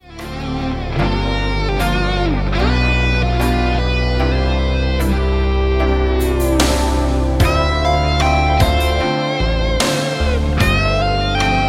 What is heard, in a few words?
Instrumental